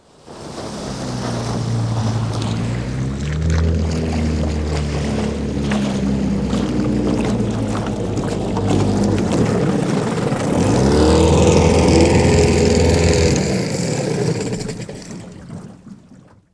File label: 10 HORSE BACK, navi; trasporti